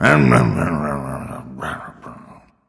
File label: grumble; chaac